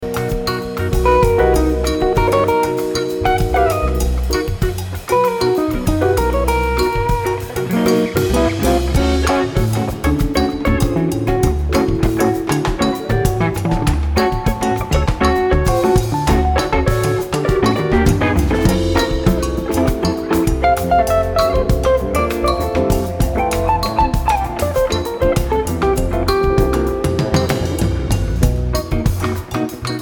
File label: Lounge Music